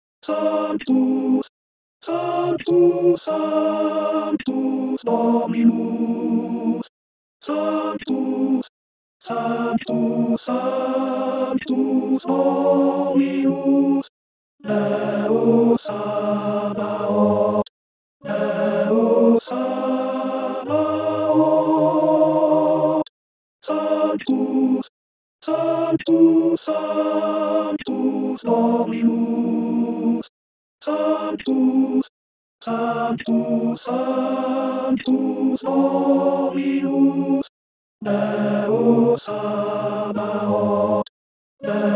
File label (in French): sactus tenor voix missa brevis